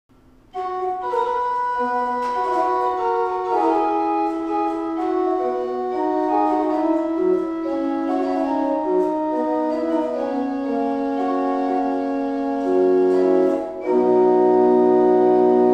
Grob Gedackt Quintadena 8 und Flauta 4, Tannenberg Organ; Gemeinhaus Saal - Old Salem, North Carolina